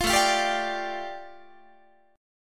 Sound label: A 7th Suspended 4th Sharp 5th A, Guitar; A7sus4#5 strummed